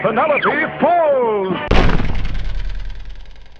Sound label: fall; mpitstop